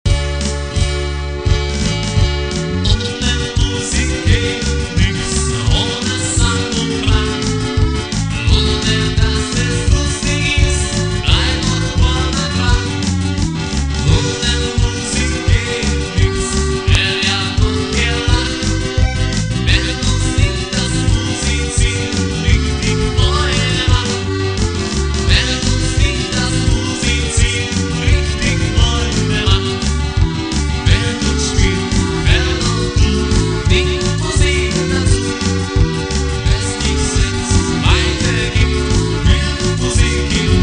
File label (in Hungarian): jodli nemet